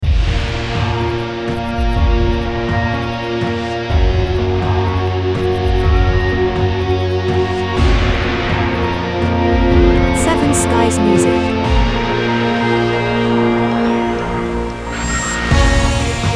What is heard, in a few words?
Orchestral Electro - Powerful tense heroic